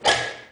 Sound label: Button press